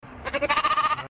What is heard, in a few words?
Goat